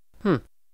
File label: Hmm; asdfmovie